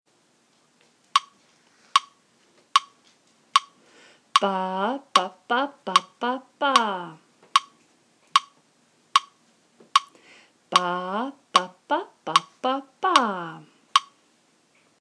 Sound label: circle rhythm